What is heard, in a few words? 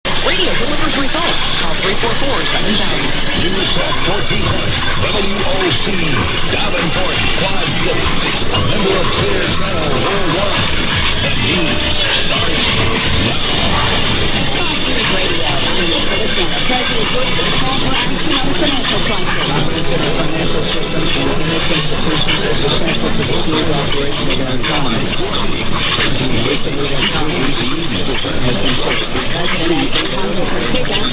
1420, mw dxing; woc; middliton poss